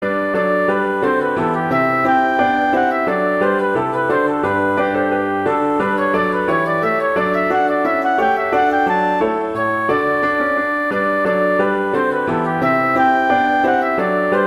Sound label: Bouree, Flute; Handel; Op1 No5